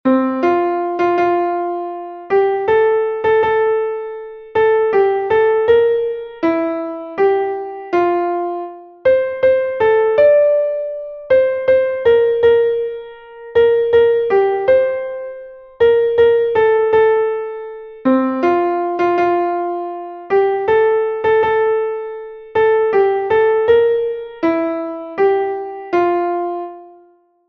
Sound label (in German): klavier; o tannenbaum; melodiemeister